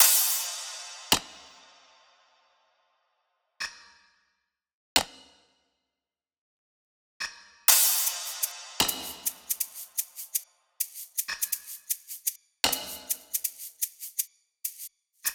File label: loop perc 125; Seismic Loops